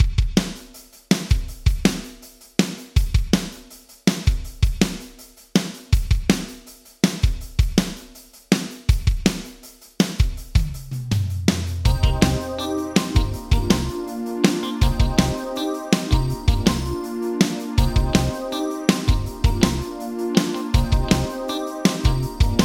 Drums Yamaha Rev7 Guitar; Vintage Digital

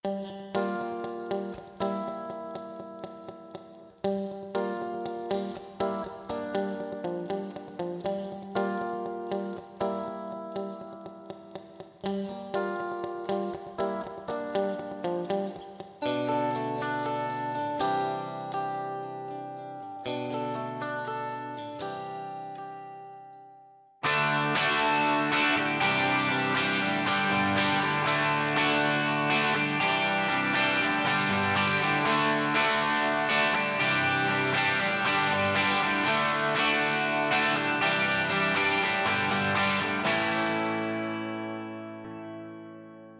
Guitar Sony MU R201 Concert Hall; Vintage Digital

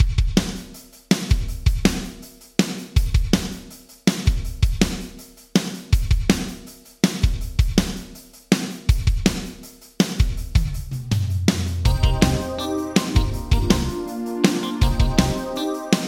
Drums Yamaha ProR3 Power Drum Room; Vintage Digital

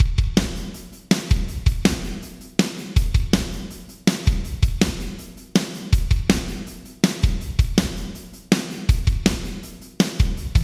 Drums Yamaha ProR3 Large Hall 2 1.0s Diffusion 10; Vintage Digital

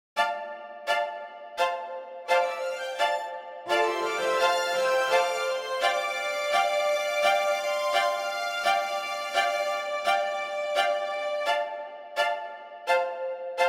Strings Ecoplate EQ

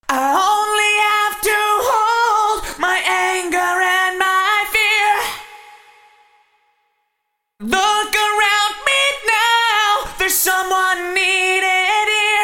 Vocals Sony HR MP5 Wav Sparkle; Vintage Digital

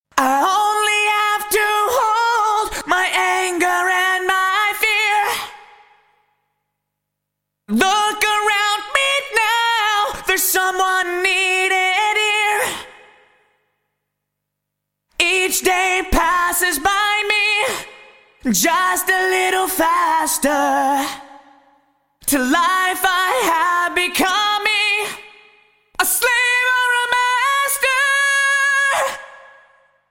Vocal Roland SRV 330 Vox Hall Ambience; Vintage Digital

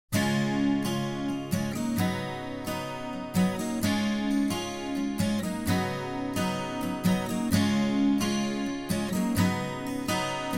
Acoustic Guitar Juno

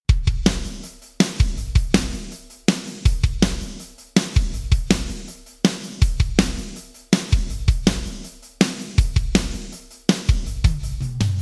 Drums Roland SRV 2000 Non Linear; Vintage Digital

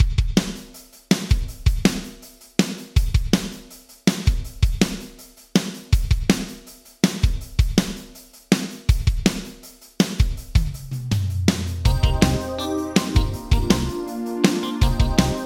Drums Yamaha ProR3 Small Room; Small Room 1; Vintage Digital

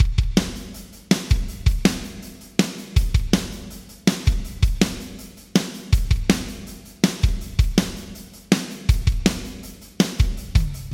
Drums Yamaha ProR3 Aquarium; Vintage Digital

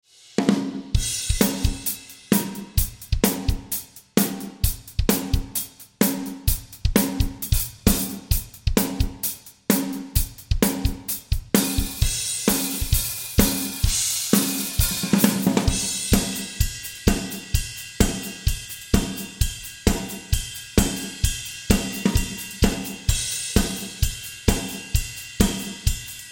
Drums Sydney Opera House 18m choir stalls; audio ease altiverb xl

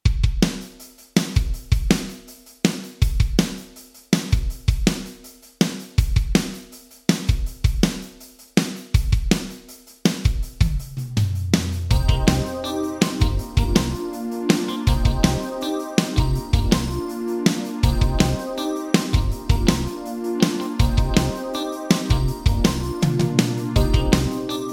Drums Sony HR MP5 Tight Plate; Vintage Digital

